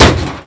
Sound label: Car hit; chicken gun